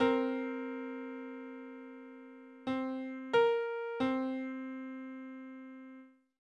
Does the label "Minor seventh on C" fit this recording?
Yes